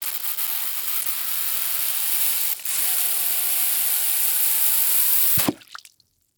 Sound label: Liquid Water Plunger Wet Pulling From Cement Suction Slowly